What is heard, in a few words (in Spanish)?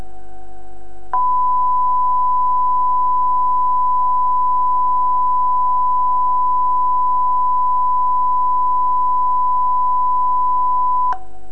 rugoso